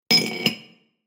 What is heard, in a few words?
Stacking glassware; household